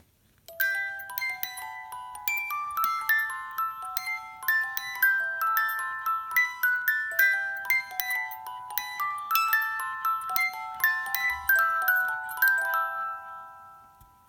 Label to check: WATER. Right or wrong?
Wrong